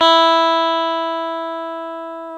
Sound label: GTR, Clean; Club-50 - Foundations Roland